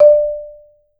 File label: MSOFT D4, Marimba; Sampleheads - Dave Samuels Marimba & Vibes